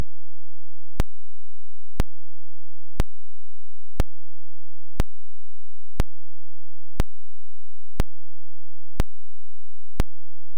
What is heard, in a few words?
pulse point5 hertz